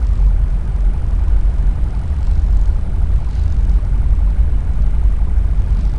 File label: Pirate Idle Loop; Earth and Beyond; EA Games